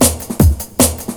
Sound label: SPEED, Big Beat Drumloops; USB Soundscan vol.25; AKAI